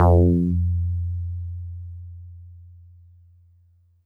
Mono Bass DX100 F3

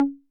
Conga Mid 808 Saturated B

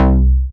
BASS Clickless

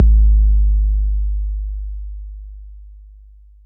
BD 808 Noise

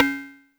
Rim Shot FX

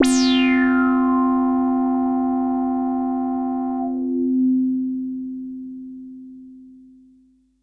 SYNTH Silver Bird

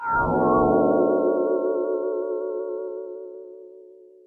SYNTH; Stetlz Moodz

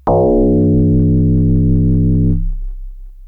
BASS Bellish bass

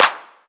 CLAP

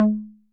Conga Low 808 Sat

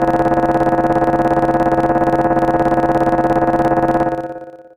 Alarm Call DX100 D1

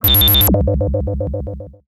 SYNTH Low

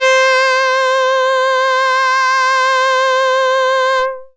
Box Cello DX100 C5

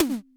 Tom Slam

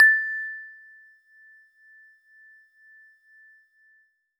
Warble Celeste DX100 A-1

